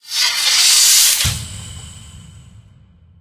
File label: dropper iris close; Source Engine; Portal 2